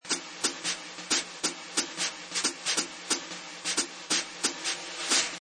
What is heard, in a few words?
Jazz drum brushes and hihat beat loop at 180 bpm.; Drum Loops